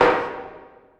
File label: snare reverbed; Snares